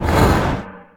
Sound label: trash slide